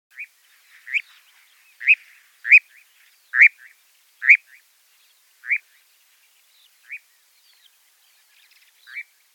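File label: obyknovennyy kozodoy golos, common nightjar voice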